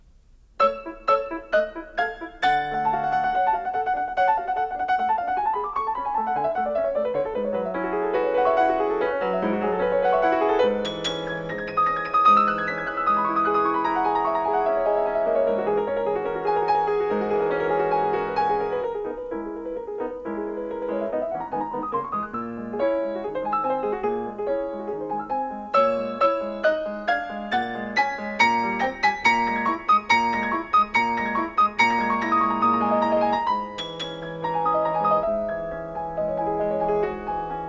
for piano, Allegretto tranquillo; Visions fugitives (20)

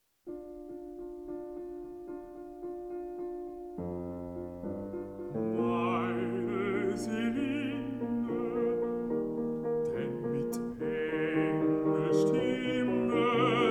song for voice & piano

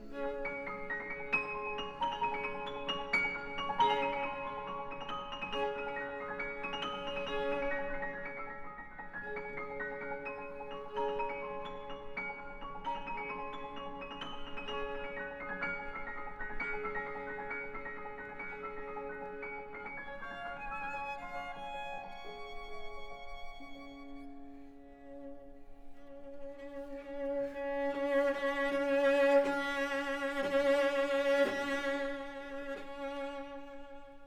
Trio for Piano, Violin and Cello in A minor; L'istesso tempo; Deutsche Grammophon

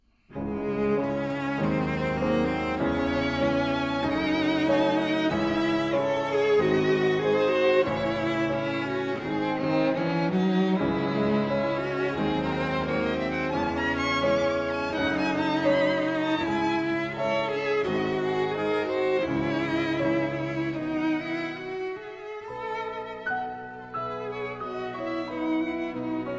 Piano Trio in A minor, Andante con moto

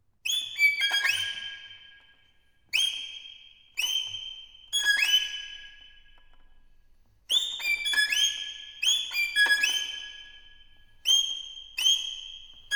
Caprice Allegro, Virtuoso Violin

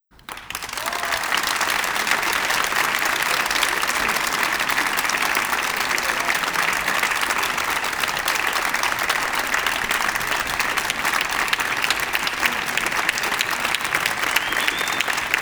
Applause (outdoor)

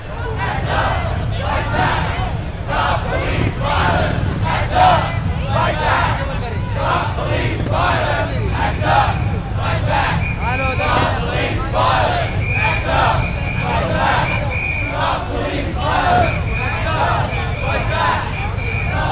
Sound Effects: Police Riot

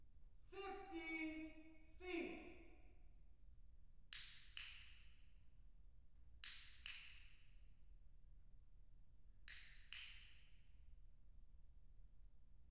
Depth of Image, Acoustic Clicker: 50 Feet

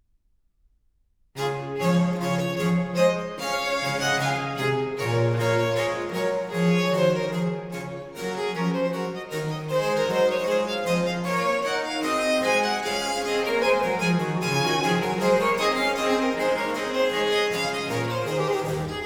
Sonata in D major, Marche, Trio Sonatas; Handel

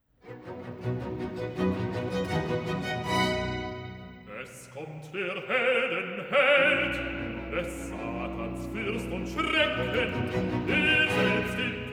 Recit (B); Bach; Cantatas; BWV 43; Es kommt der Helden Held

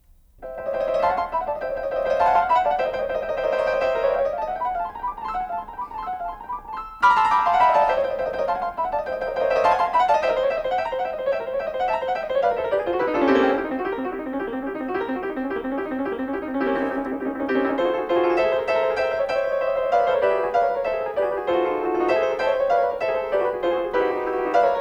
suite for piano

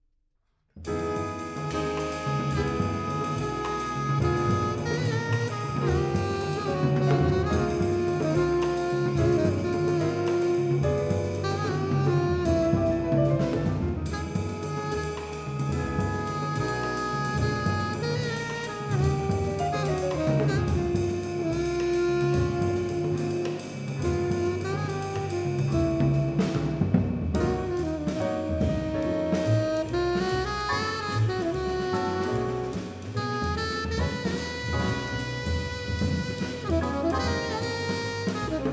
Sennheiser Mkh 30, microphone; Das Mikrofon, Vol. 2; The History of the Condenser Microphone

